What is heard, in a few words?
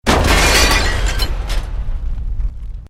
Explosion Medium Metal Glass